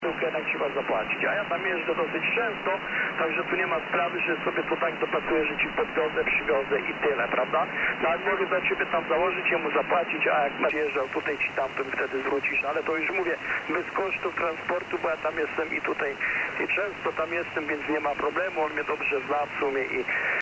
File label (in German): Amateurfunk 20m USB